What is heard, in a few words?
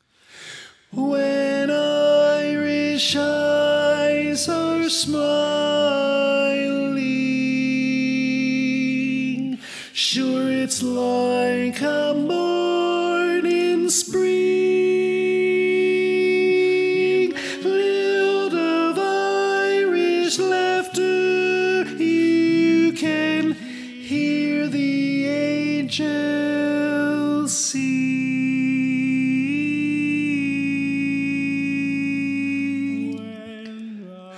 Tenor